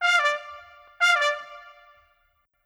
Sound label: Trumpet, Orchestral; Sonic Foundry (Sony Creative Software); Orchestral VOL-2; Modern 07